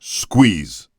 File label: squeeze